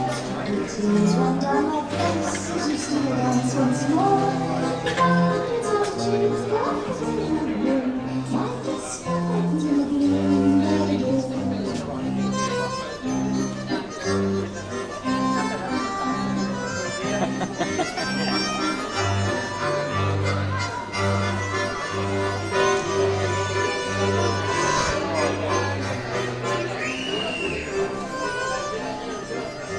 tango and accordian folk in Southbank Royal Festival Hall